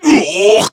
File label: monster damage, voice